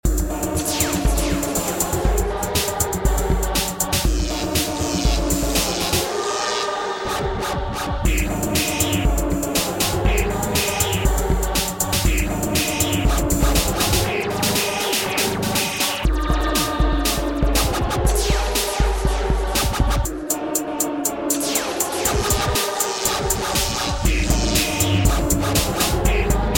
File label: Old School Beat